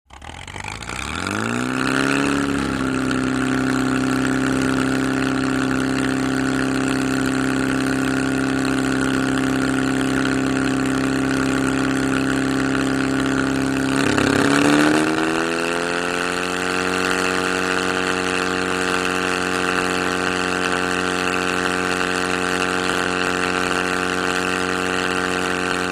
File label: Motorcycle starts, moves, turns off